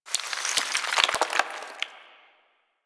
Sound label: Falling Sand Rock; Ambience; Man In The Wall